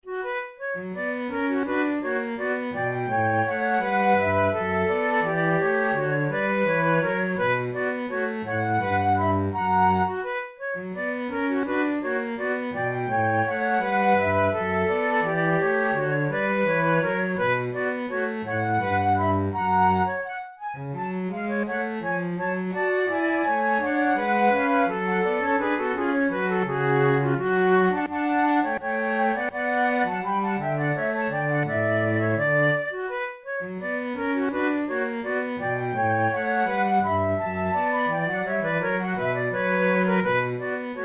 trio sonata tempo di gavotta, flute tunes; corelli

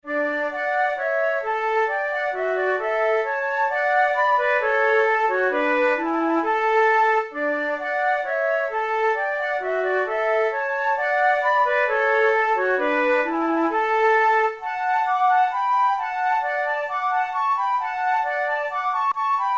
duet, flute

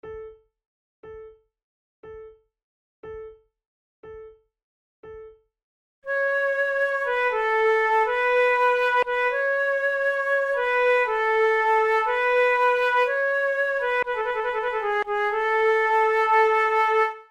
concerts for two flutes sarabande; Monteclair; flute tunes

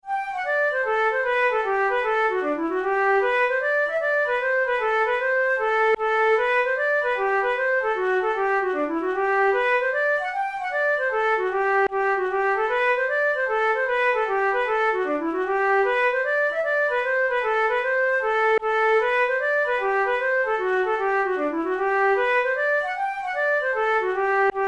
hornpipe

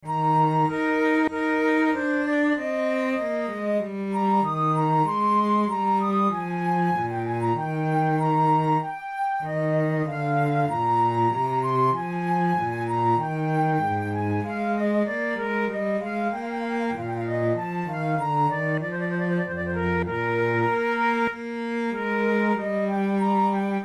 adagio, flute

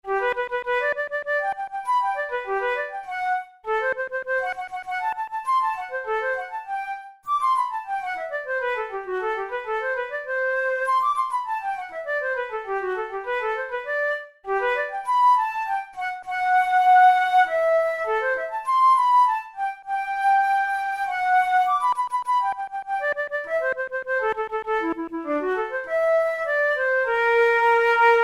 studies for the boehm flute; drouet 72

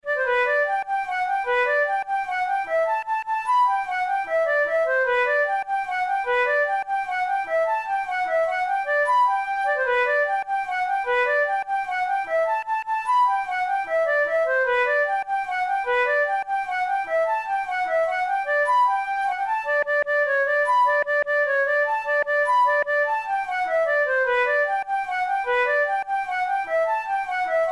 the drum major, flute

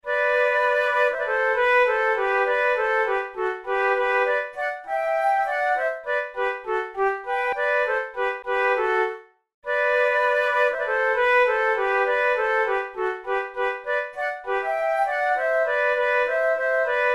duet, flute

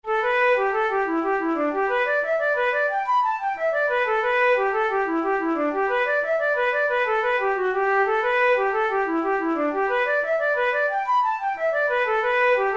jig

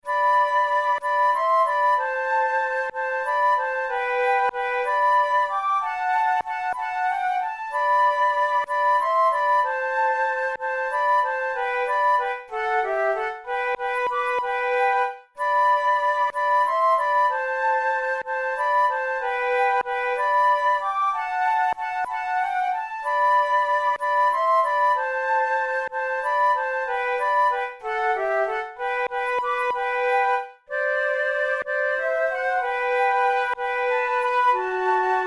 duet, flute

